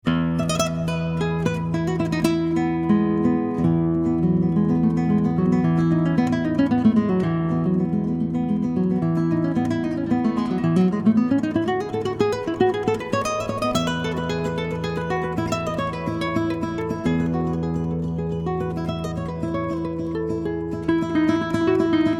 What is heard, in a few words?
Prelude